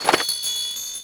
Post Card pickup; Cars; XBOX

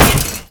Sweetner Metal, impacts; Totaled; XBOX

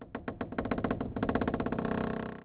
wood creak; Ambient; MX vs ATV Unleashed

